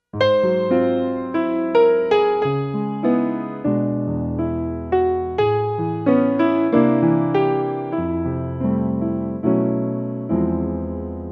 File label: jazz fragment